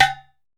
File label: UDU; AMG - Now CD-ROM (Roland); Rhythm Of Life